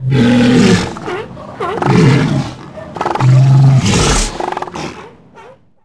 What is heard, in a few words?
sealion; animal; Tony Hawk's Project 8; XBOX